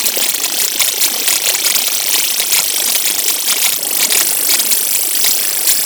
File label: Beam Loop; Warframe; Corpus; Super Moa Beam